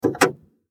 公衆電話ボタン

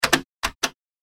自販機 お釣りないか確認

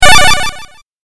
8bit 回復

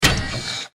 大型ロボットの駆動音

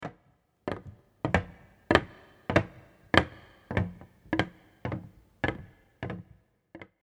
下駄の足音

